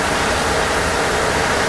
indoor rain; nature; hl2

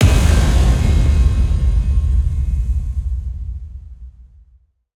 Angry E, Effective Trailer Hits & Impacts; Federico Soler Fernández

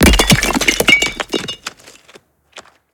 Impact brick rock dirt gravel designed multi LFE; PMSFX; Shattering Bricks

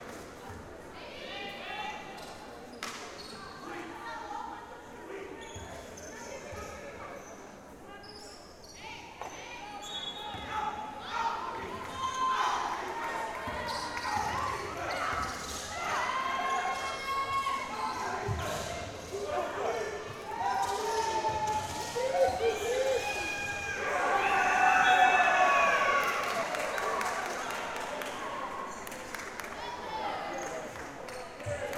Feminine League Match, Basketball Match Ambience; Sonic Bat

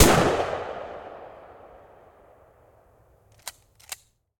Shotgun Fire Round Eject, 12 Gauge Shotgun; TS Sound

